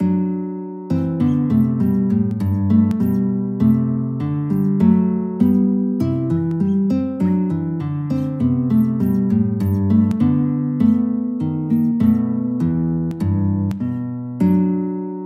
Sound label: Wandering Willie guitar